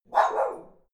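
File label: bark big, dogs; natur-tiere; hunde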